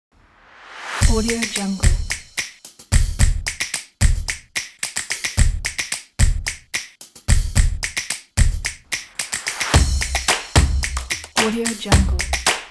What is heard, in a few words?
claps and stomps